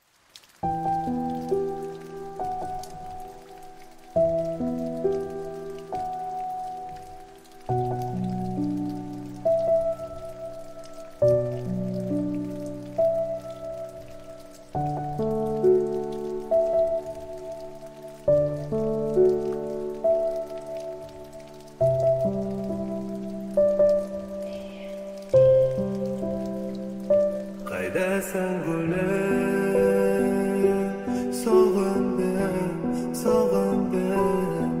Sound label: piano version